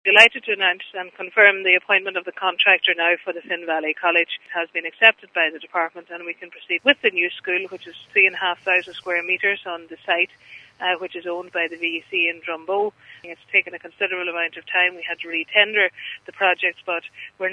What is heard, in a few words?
cough